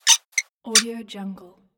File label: faucet squeak